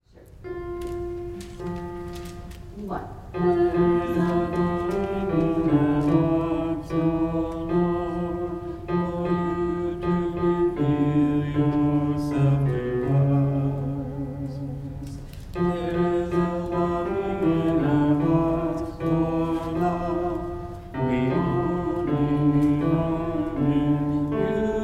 tenor; Gather Hymnal

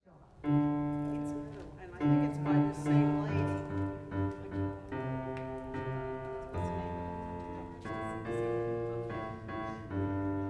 bass; Gather Hymnal

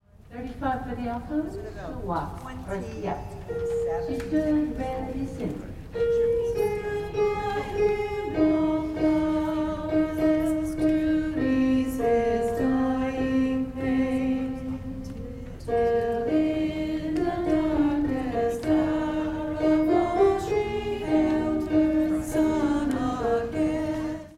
alto

